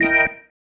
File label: Stab One Shots, Organ